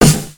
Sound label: Snare